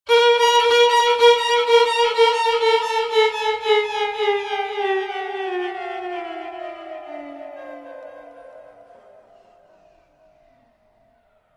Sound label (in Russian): zvuki skripki